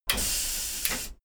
train intercity train hydraulic slide door hydraulics